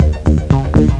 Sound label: bar d#-c#-d#; Swoop 1.0.0; Swoop Music